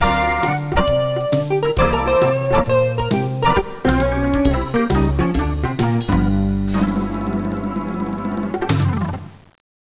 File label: GUIT BLUS